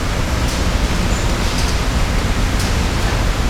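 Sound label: indoor loop; Garry's Mod; tf2; cp harbor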